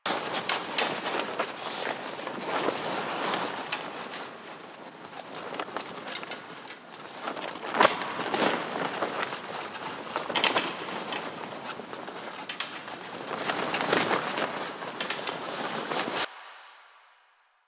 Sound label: Tarp Rustle Loop; Warframe; Ambience; Nineteen Ninety Nine